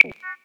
UI sci-fi Compute Dry; stereo

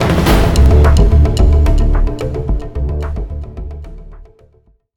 Threat Escalated; homefront gamerip 2011

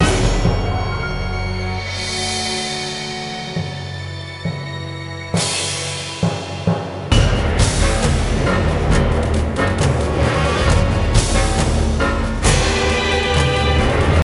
Pirate Battle; Lego Battles 2009 NDS

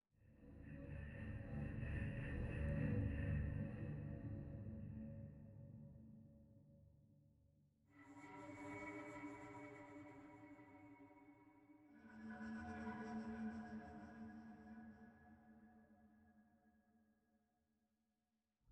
Citadel Ghost Pad; Frozen Flame; windows

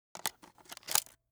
Camera; yesterday origins gamerip 2016 switch ps4 pc ios macos

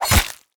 ATTACK POINT

